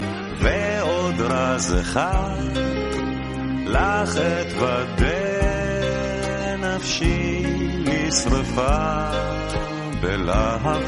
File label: bollywood song tone ringtone